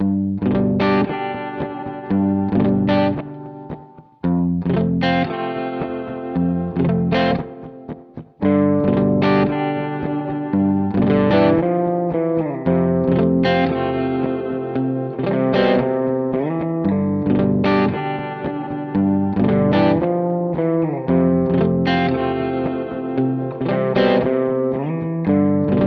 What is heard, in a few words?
Menu Music; Beach Buggy Blitz